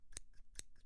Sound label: Scissors Shears; Scissors Kit